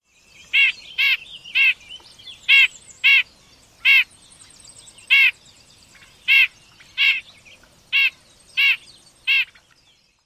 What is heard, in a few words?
yank calls